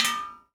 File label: Just A Small Metal Hit; Nova Sound